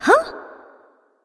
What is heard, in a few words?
confused; smite; serqet